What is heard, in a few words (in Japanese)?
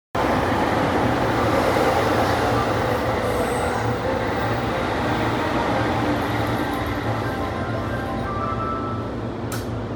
京浜東北線蕨北, train sounds